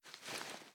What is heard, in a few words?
kilo98 reload empty cloth; gmod; viper